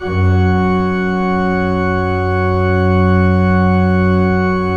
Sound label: PIPE, 3DOrgans